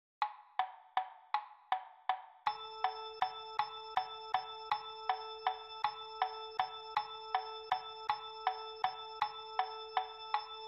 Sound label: Rhythm Practice; A note